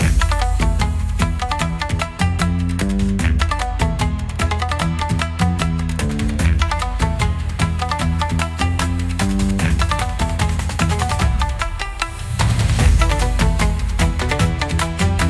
Thunder on the Pier, cinematic japanese style battles